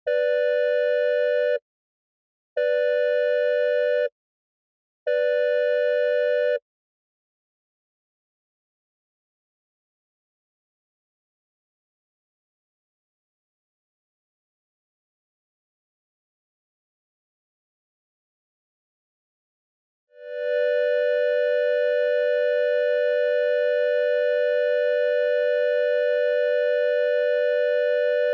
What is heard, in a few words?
sirens synthesised caution alert attack large fire evacuate